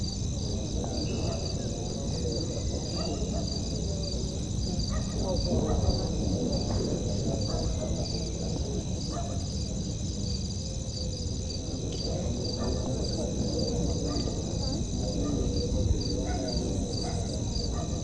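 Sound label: ambient night city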